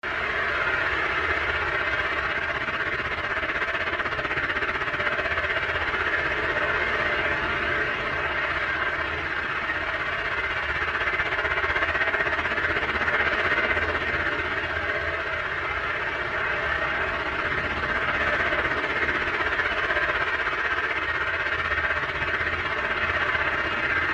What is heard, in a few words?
WHOOSH